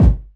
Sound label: bass drum, 135 BPM, Drum & Bass; Ueberschall; Drum & Bass Flash Trackz